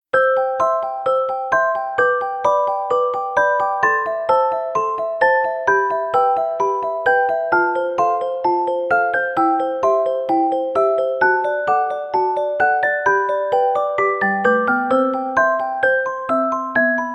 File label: Celesta